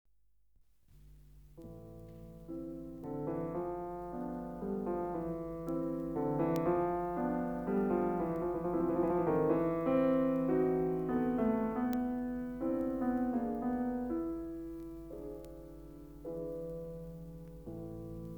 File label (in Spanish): Valse; Chopin